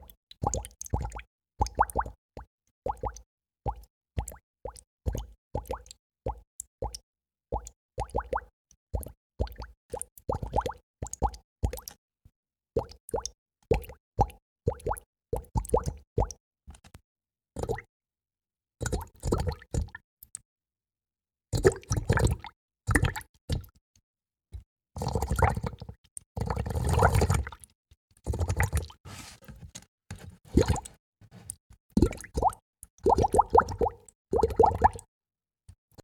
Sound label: Wet Small bubbles